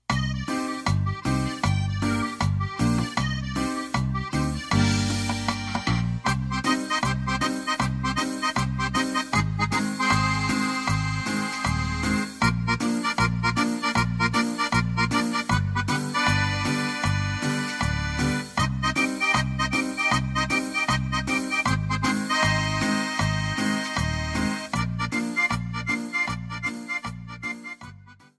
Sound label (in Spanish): Cumbia